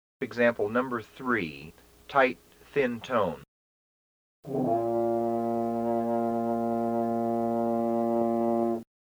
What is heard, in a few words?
Emb TUBA